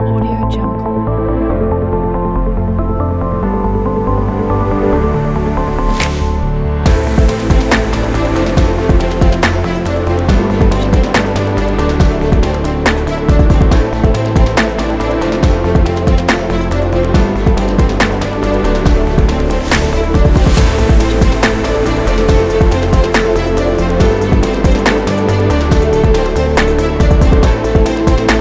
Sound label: digital technology; onewaysound